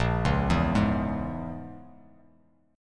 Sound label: Bb Minor 7th Flat 5th, Bass Standard; Bbm7b5 arpeggiated